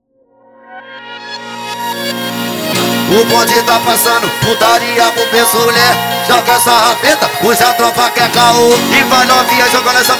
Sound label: Brega Do Baile, funk